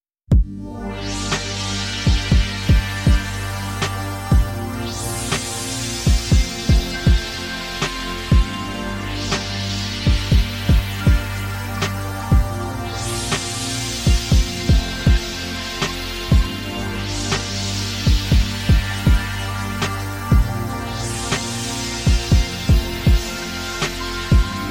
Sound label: WAVESTATION